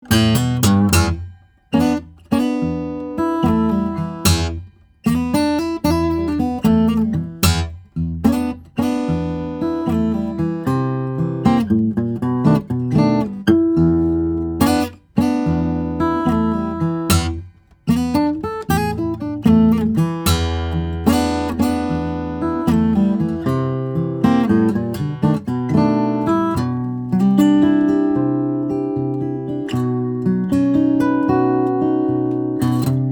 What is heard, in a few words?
000, steel string guitars flattop; koa